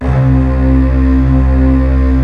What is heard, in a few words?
HALL STRINGS, EMU; Rubber Chicken Software Sample Bank